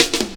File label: Drum Loops, 165.5; Zero-G Jungle Warfare 1, 2 & 3